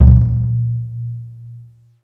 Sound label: Tom Low; Lightning1024